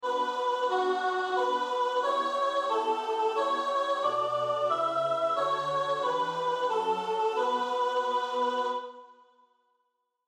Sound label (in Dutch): sopraan; Motetten; Ave Regina Caelorum